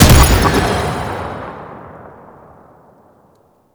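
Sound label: Primary Projectile Explosion, Weapons; Warframe; Cephalon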